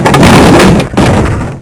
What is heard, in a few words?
Hit Gate